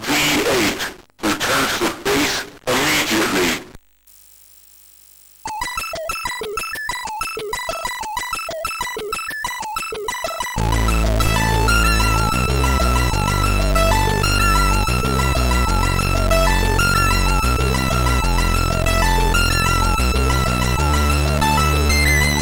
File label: c64 real hw; c64ii; the last v8